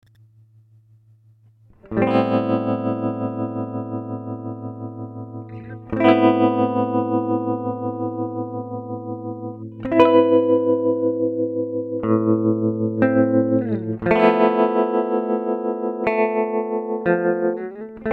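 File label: tremolo; colour theory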